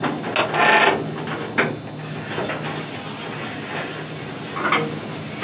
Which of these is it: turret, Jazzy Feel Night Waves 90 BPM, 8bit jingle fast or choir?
turret